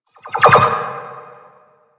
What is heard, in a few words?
Rolling Latcher Embed Glow